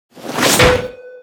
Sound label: Sfx Winter games